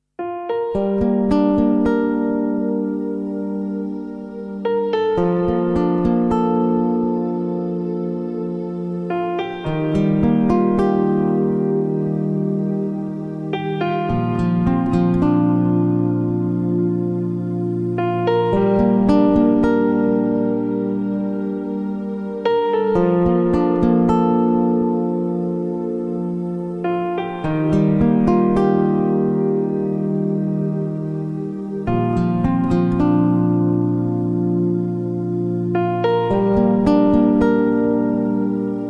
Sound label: Reiki Healing Waves